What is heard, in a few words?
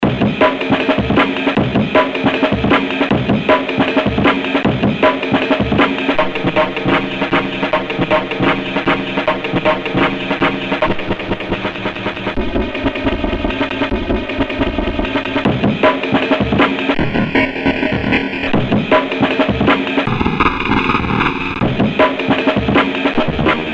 vss200 amen